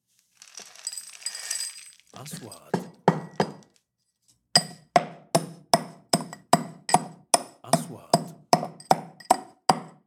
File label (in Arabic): صوت دق الهيل حبوب